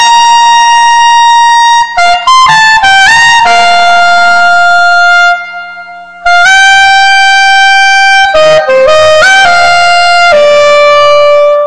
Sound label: Cumbia; Cumbia Universal